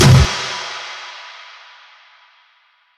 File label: TOM, Jungle; Gorge Sample Pack Vol.1; San Gabriel